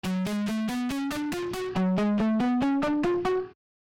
Distortion VS Overdrive Boss DS 1 Distortion vs Boss Blues Driver BD 2 Overdrive